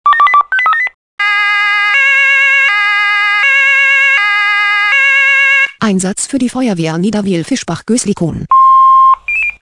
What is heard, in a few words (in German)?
SMS Alarmierungstone